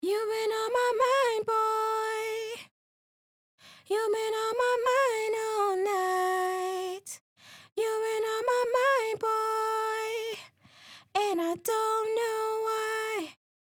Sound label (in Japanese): Pitch あり solo